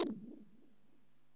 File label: ANALOG BELL; Zero-G; Ambient 1